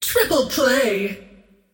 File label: scream; queenofpain